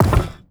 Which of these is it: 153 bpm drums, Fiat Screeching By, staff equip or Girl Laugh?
staff equip